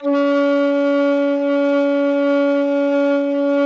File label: TRAV D4, traverse; SoundBytes - Early Patches